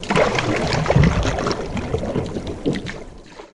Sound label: Canoe Paddle Stroke Underwater